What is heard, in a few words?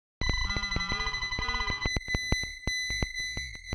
glass smear synth seq, em; instruments; loops